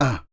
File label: perc voice, Roland MC-909